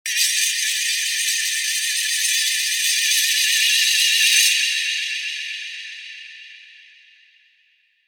twirl

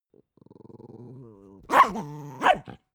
dog small growl bark double

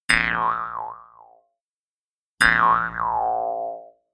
mouth harp

